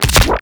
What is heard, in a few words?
Weapon Punch Shotgun; Warframe; Weapons; Shotguns